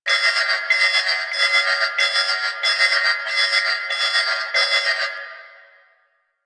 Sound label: Infested Mist Lantern Equipped No Energy Loop; Warframe; Infested Eris; Encounters